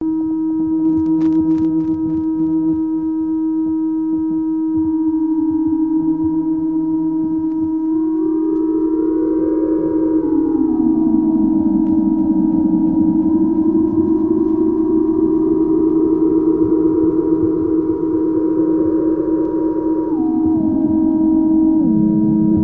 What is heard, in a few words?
eerie analog synth journey